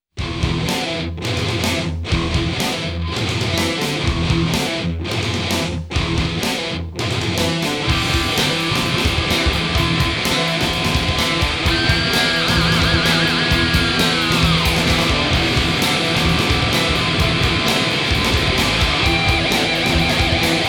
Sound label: instrumental